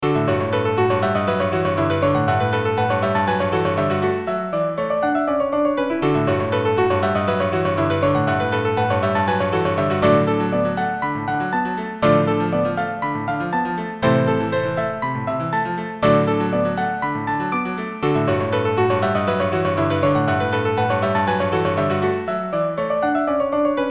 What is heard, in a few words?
chaos for two pianos, chiptunes